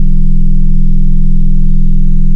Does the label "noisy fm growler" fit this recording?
Yes